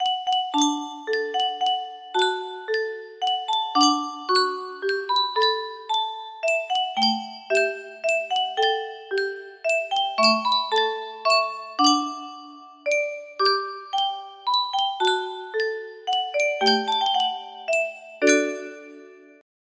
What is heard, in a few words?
music box lullaby, music box melody